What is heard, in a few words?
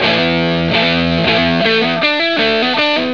loop paranoid